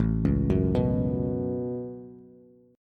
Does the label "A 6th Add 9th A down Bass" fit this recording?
Yes